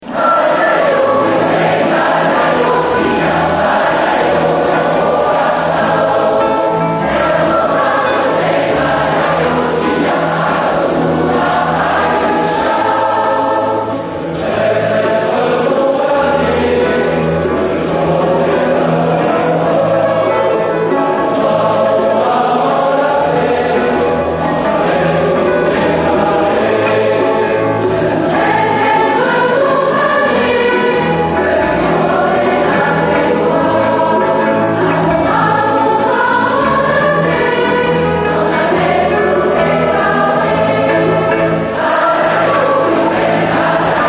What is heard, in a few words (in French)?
Choeur Mixte et Voix Égales; Concert Vogue les Choeurs autour du monde; Balaio